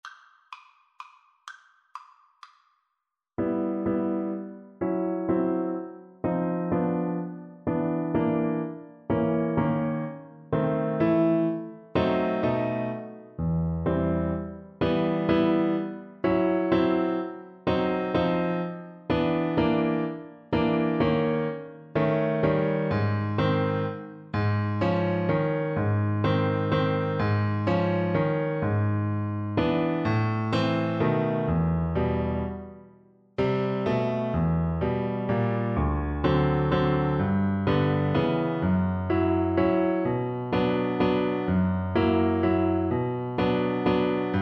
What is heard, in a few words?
mazurka violin; op68 no4